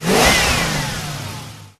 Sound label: power up; escape the fuzz